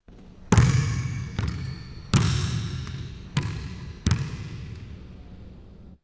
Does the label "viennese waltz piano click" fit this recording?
No